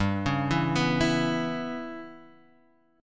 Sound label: G 6th Flat 5th, Guitar, Standard; G6b5 arpeggiated; JGuitar